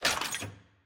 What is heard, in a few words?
Music Box Fix Disc Loader